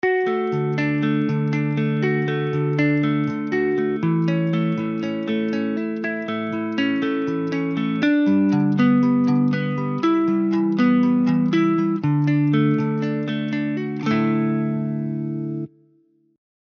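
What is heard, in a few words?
arpeggio Ibiza; Les-Paul-Modell; Session Guitarist Electric Sunburst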